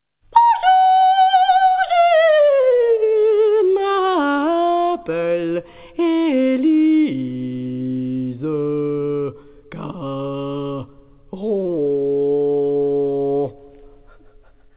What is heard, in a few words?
vocal